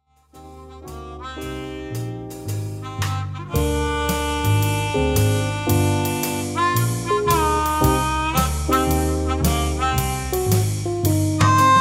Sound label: Jazz or Blues